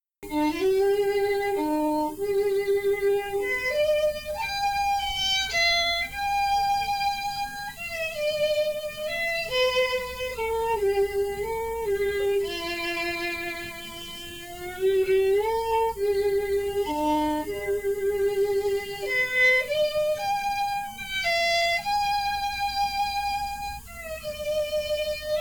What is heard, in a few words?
fiddle; boolavogue